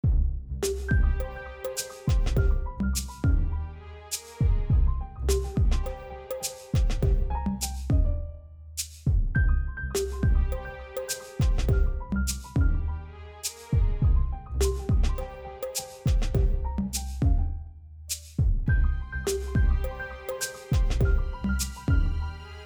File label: Dinner Party (piano); Music For Film